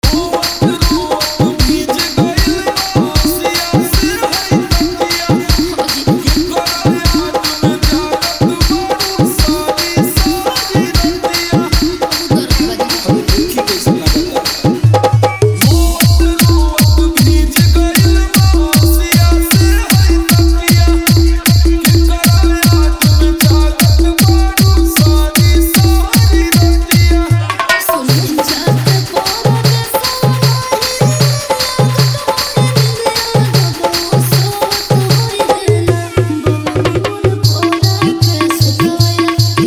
New Bhojpuri Dj Remix Song

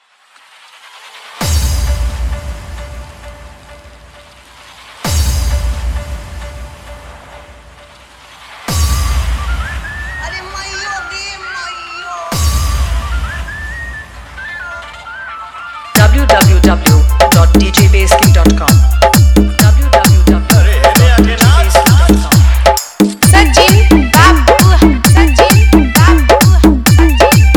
Hard Vibration Mix

